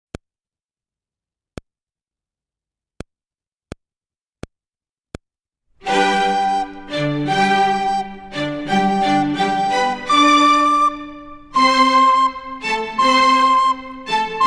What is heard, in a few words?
Little Recorder Music A slow